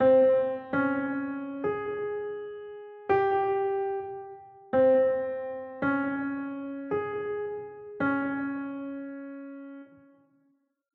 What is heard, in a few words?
Piano; HumanityPiano; Lies of P; Xbox Series X/S